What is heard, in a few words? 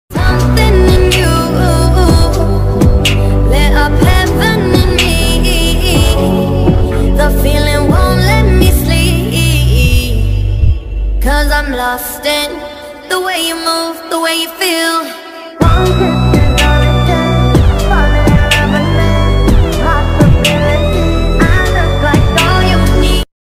korean ringtone